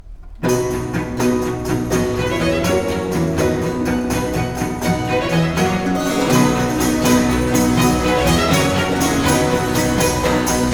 Sinfonia for strings and basso continuo in C Major Presto; RV. 112 III.; Vivaldi I colori dell'ombra; Pulcinella Orchestra